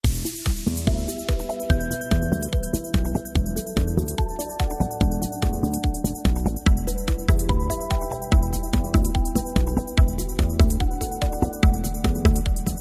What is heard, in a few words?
Tech Crunch Kick Beat